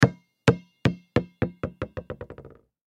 Basketball Drop; Bounce Wood Surface

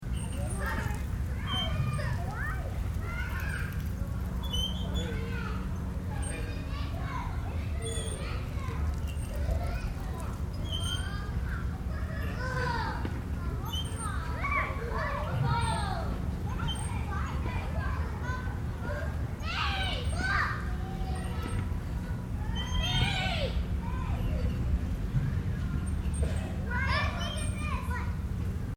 Playground Kids Playing Loop; Children playing with swings in playground. Children and parents talking, distant city no; Playground Sound Effects; Lynne Music

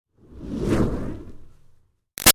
Fire Torch Whoosh Slow Lite; Flames; Objects

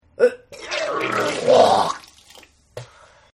Vomit; Vomiting; Sound Effects:Human

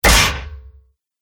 Great Axe Whacks Armor; Impact Sound Effects; combat sound effects

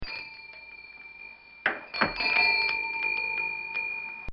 Store Entrance Bell; Bells; Musical

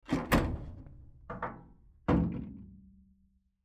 Dryer Door Open; Appliance; Household; Blastwave

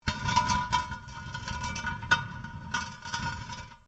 METAL ON STONE LARGE SCRAPE

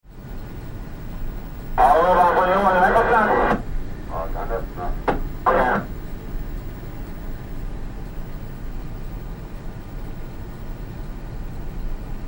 Ship Large Ferry Bridge Atmosphere; Tom Hutchings

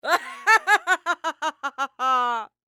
Human Female Laugh Evil; Laughter; Voice

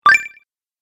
Magical Token, Magic Sound Effects

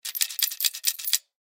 Coin Dispenser; Nickel Multiple; Money; Objects; Blastwave; Foley

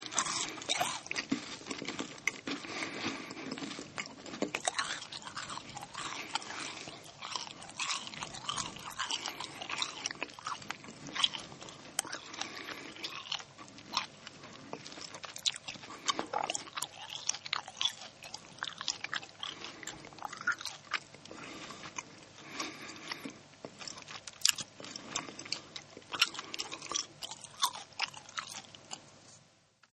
Crunch n Chew, Eating; Sound Effects:Human; Crunch N Chew 2

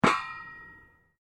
Sport Ball Rubber Bounce Metal Grill, Rubber ball; Bounces; Objects

